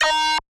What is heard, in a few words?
Fill Tech Square 160 D#